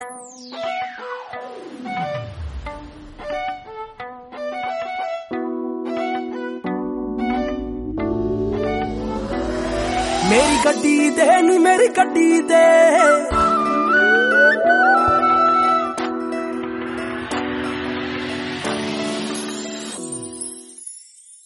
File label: Instrumental